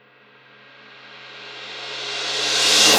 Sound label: Cymbal Riser; JayCactusMusic